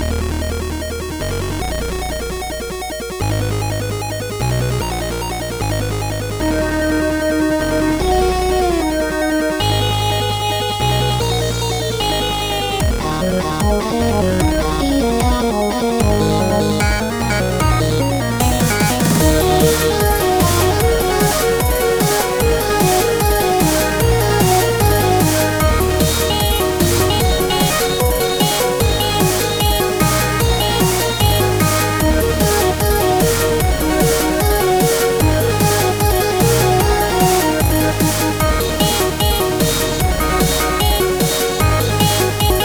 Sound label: Pulse Wave; Koity